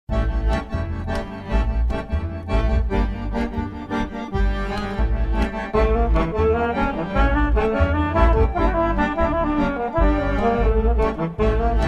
Swing

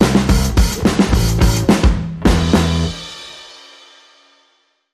Bumper

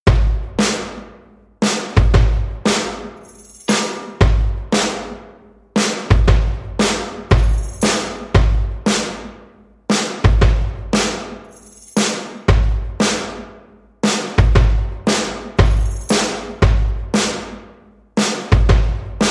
Drums and Bass Version

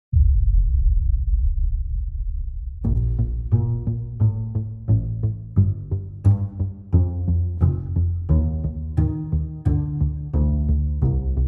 Ethereal Hope, Percussion and Bass Only

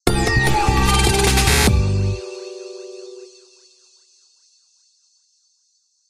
Ethereal Sting

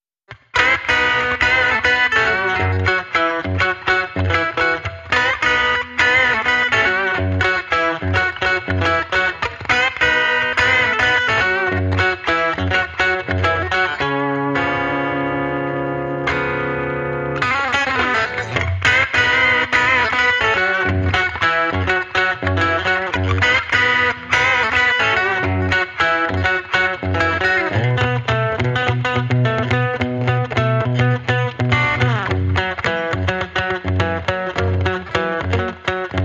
Electric Redneck Guitar Only

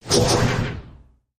Fat Swirl

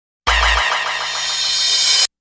Kick Back

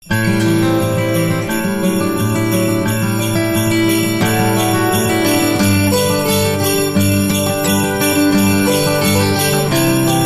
Jingle Bells

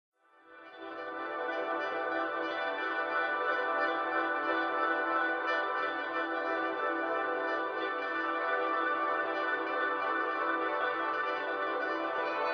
Guitars

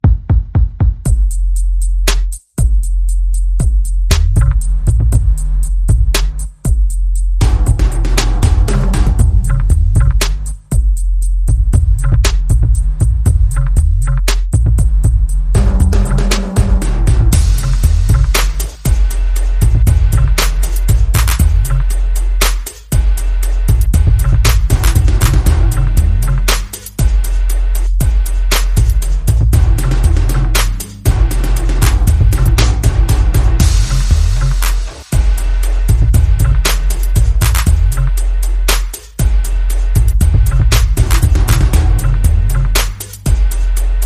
Bass and Perc

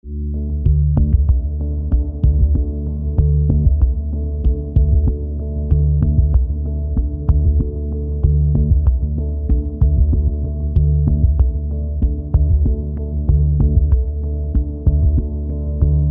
Beating Pulse

